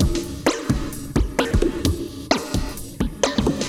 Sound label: Moog Phaser HR-16B (130BPM), cyborg beats